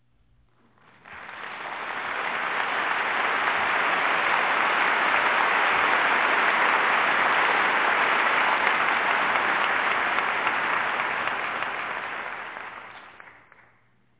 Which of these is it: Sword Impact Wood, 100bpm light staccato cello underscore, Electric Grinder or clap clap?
clap clap